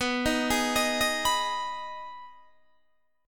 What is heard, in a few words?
B 6th B down Guitar Open D